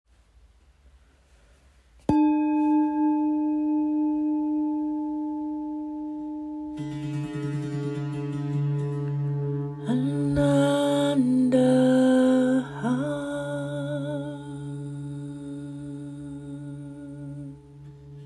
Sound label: Ananda Hum